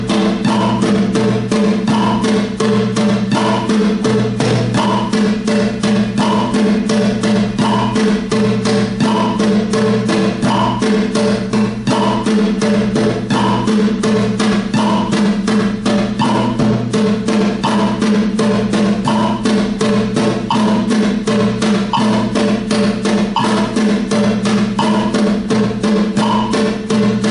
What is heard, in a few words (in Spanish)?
Rasgueo, guitar